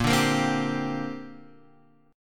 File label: Bb 6th Add 9th Bb Guitar Half Step Down